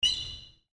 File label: sneakers squeak